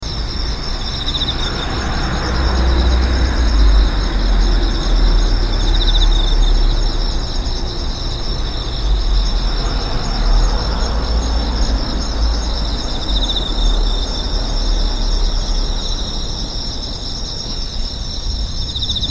fall streets

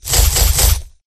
cut with sword

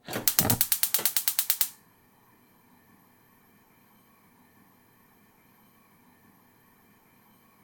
kitchen gas switch; cooking

